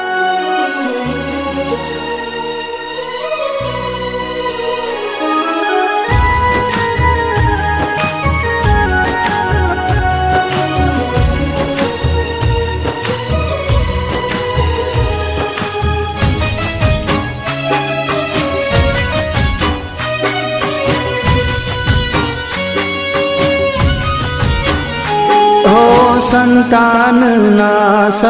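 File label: Gujarati ringtone; gujarathi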